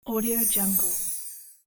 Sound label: magic shine